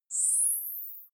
navigation point

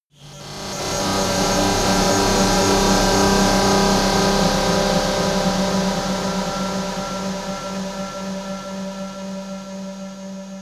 dark beam load; energie

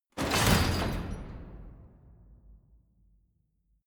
metal chain stack drop

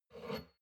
shot glass grab table slide soft; berufe; soundpool

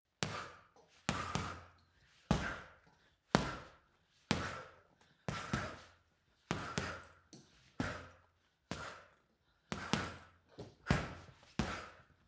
boxing punching bag boxing sequence female breath; sport-freizeit; boxen

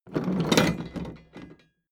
metal box roll smooth; maschinen; soundpool

